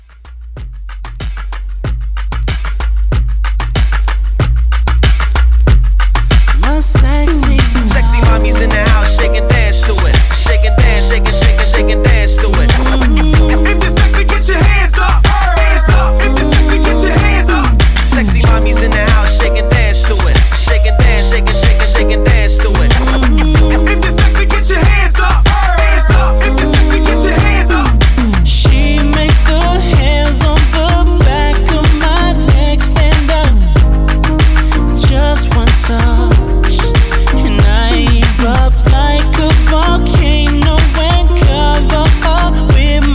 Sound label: Hype Re-Drum 94